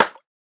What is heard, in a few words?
clap; smite; ganesha